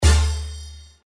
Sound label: wild hit; Fortune God